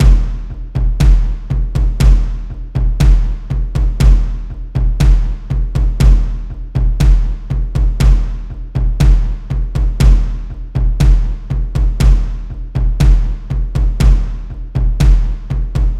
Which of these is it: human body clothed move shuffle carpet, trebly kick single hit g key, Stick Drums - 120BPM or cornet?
Stick Drums - 120BPM